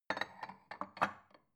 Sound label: bowl shelve soft